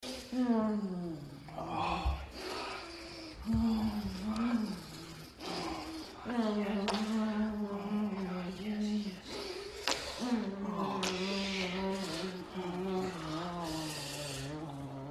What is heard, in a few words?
suck, moans; The Witcher Hunt